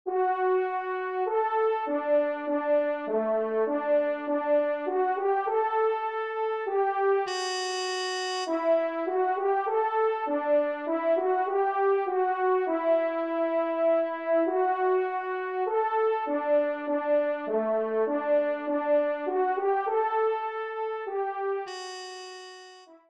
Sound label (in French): Menuet Do Maj TRP; Corrette